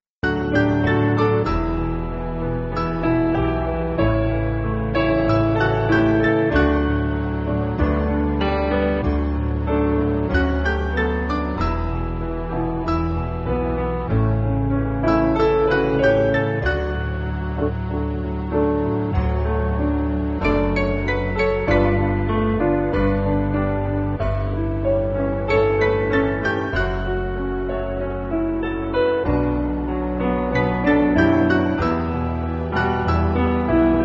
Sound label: Piano